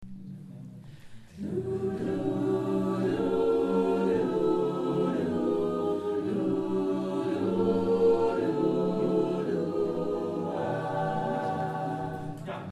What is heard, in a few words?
jazz harm